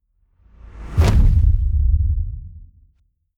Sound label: implosion fold up, whooshes; whooshes rises